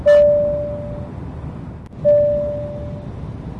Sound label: Airplane Ding, Cockpit Warnglocken; Warnglockenton, aus einem Airbus Cockpit